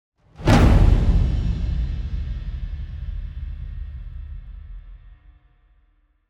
Africa Whoosh; Gothic Storm Music

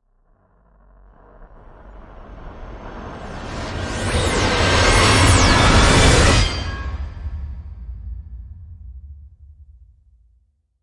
Power Up; Gothic Storm Music; Brain Warp (Sound Design Trailer Tools)

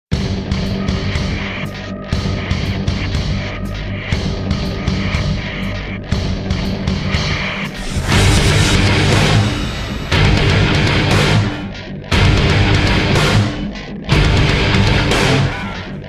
Total Harmonic Distortion

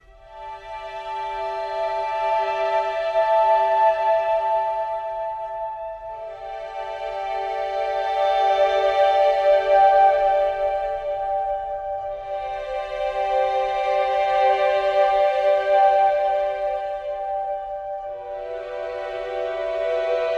Reminiscing Ambient

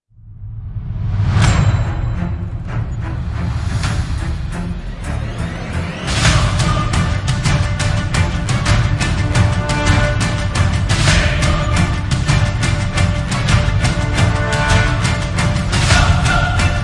Scream Again

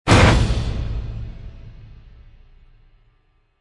Evil Scraper; Gothic Storm Music

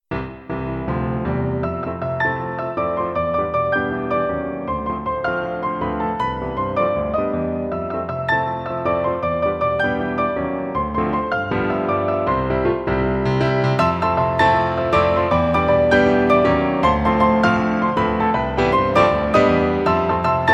Flying Petals (Piano Duet)

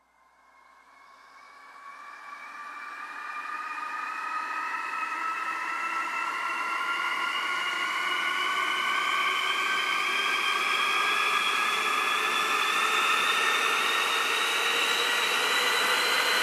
Rise; Ninja Tracks

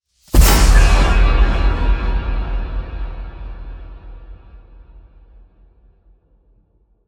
Impacts Demolition; Gothic Storm Music; Advanced Sound Design

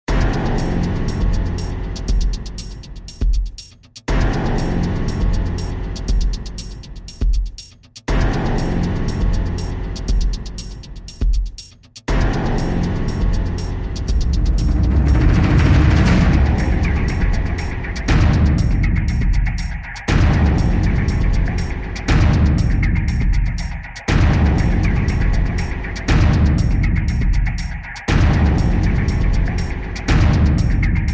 Percussion; Fired Earth Music

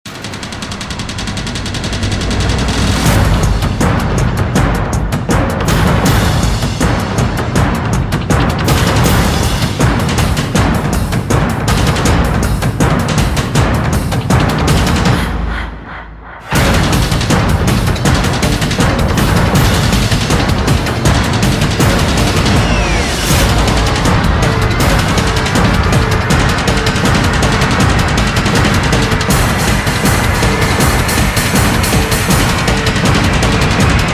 Drums Remix

